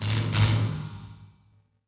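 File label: Zap Large, electrical sparks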